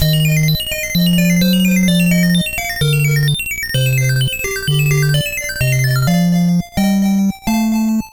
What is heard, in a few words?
Stage Intro; Bubble Bobble